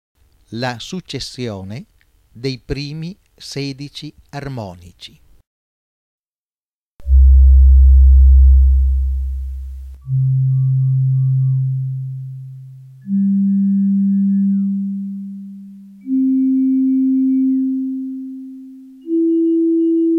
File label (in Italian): La successione dei primi 16 armonici; tutti con la stessa intensità; prendendo come suono fondamentale, o 1° armonico, la nota Do; Ogni armonico è costituito da un'onda sinusoidale